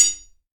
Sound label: IRONWARE, IRON WARE HIT; 1000fx